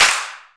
small reverb clap sound f key; single hits one shots

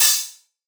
subtle reverb open hat sound e key; Duration 0:01; Bitdepth 24

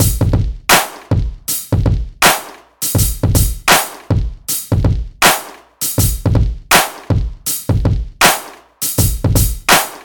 80 bpm drum beat g sharp key

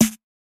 old school noisy acoustic snare A key; drum samples

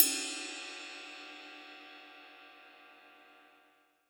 big room ride cymbal e key

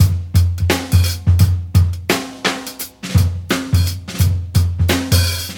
86 bpm 00s jazz drum beat f sharp key

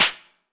clap single hit f key, Large Room Clap; percussion; single hits one shots

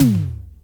1980s verby reggae tome drum sample c sharp key

